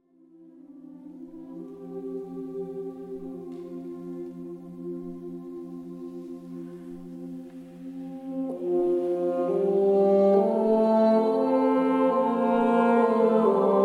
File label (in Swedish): Ungefär 4 improvisationer